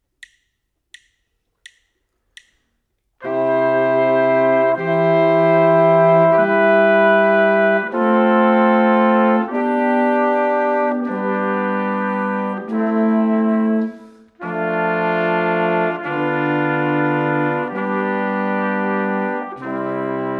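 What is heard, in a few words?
harmonic dictation, brass quartet; ear training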